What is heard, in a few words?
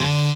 cello